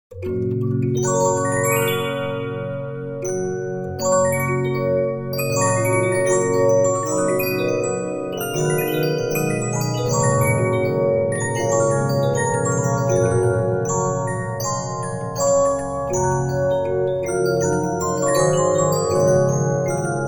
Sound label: Regina, 15inch disc musicbox